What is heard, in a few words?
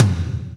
TOM TOM, Roland